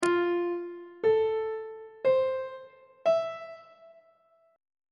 g double flat major 7th chord on treble clef

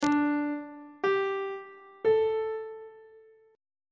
d suspended 4th triad chord intervals; on treble clef

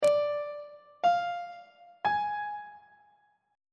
f melodic minor chord 6 is d diminished; on treble clef

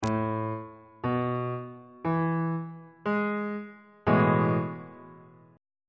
a major 7th suspended 2nd chord on bass clef

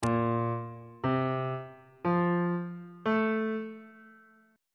a sharp major 7th suspended 2nd chord intervals

